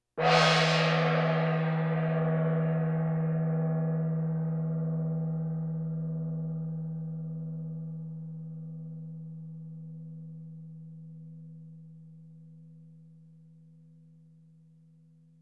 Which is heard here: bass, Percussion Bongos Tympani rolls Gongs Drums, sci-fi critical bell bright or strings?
Percussion Bongos Tympani rolls Gongs Drums